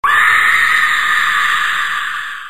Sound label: widow scream; Dice World